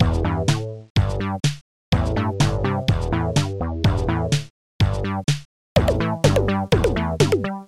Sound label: populous groove